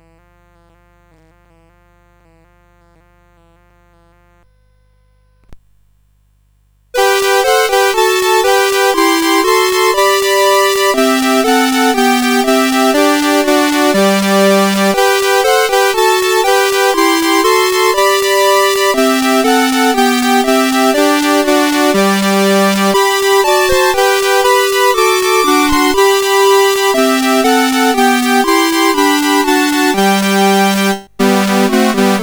As with Gladness Men of Old, CSG8580R5

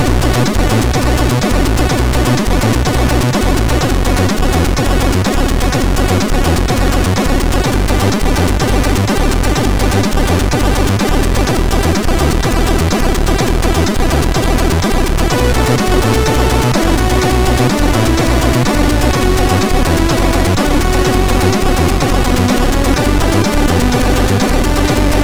Introtune, sid MOS6581R3; Strike Force

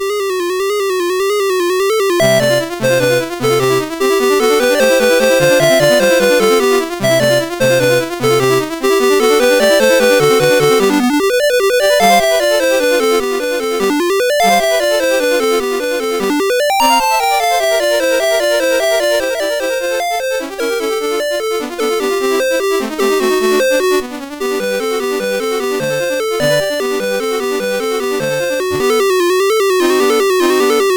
Spinning Song, CSG8580R5; Dave Marquis

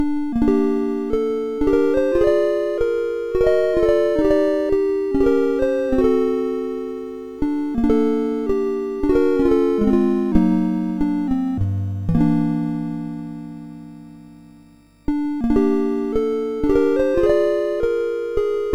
Nocturne, sid MOS6581R4

